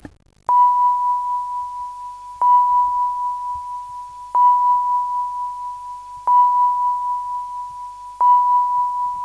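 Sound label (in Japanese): celesta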